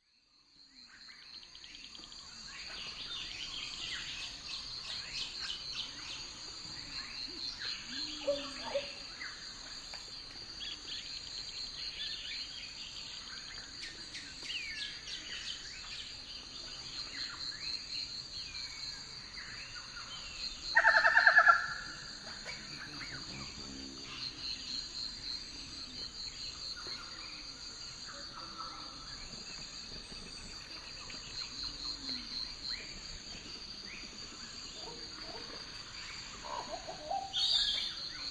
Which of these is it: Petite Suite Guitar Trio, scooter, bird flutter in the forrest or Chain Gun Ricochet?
bird flutter in the forrest